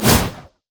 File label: impaling charge speed; LoL; hecarim